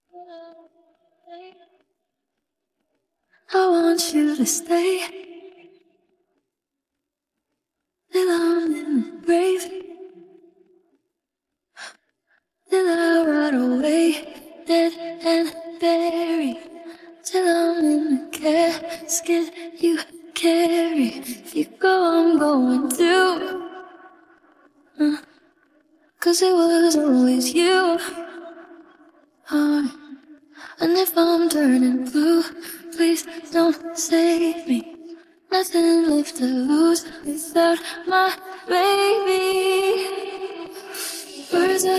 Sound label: vocals